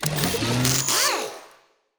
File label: ship designer add utility; Stellaris